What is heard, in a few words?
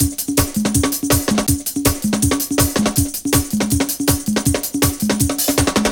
Loops, breaks; M8